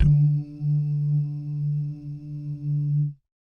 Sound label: BASS DUMMS, Vocal Planet Jazz FX; Spectrasonics